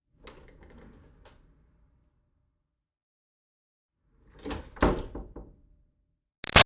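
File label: Door Wood Office Push Med Open-Close; Miscellaneous Doors; BigRoomSound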